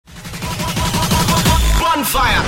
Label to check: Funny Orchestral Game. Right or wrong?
Wrong